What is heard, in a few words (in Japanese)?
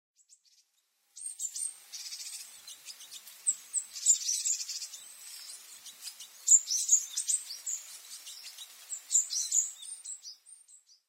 amamishijukara, amami, koe; yanagawa